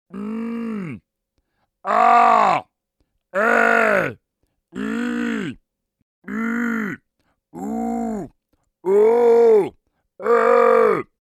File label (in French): Distorsion ary epiglottique ou Growl